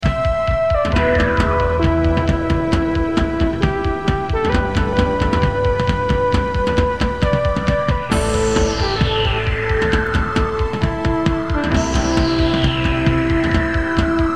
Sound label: ringtone